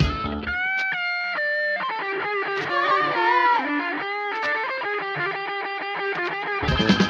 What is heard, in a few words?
guitar, Loops, Phonky